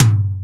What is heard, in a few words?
ACOUSTIC TOM